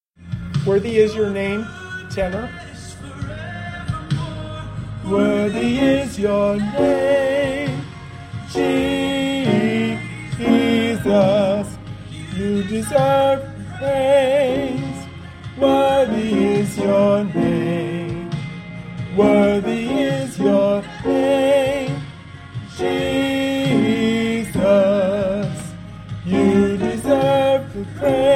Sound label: tenor Db